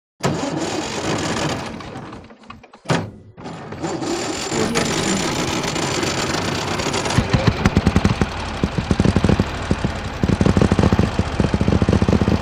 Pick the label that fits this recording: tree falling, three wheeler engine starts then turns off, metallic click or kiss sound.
three wheeler engine starts then turns off